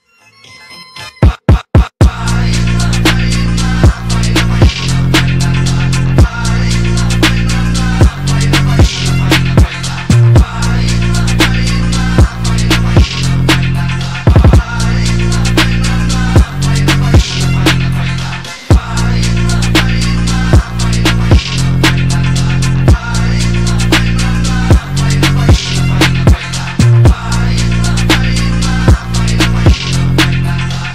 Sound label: phonk remix